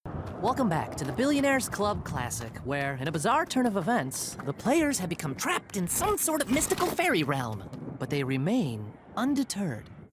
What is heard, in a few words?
golf